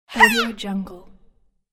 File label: cartoon manga girl scream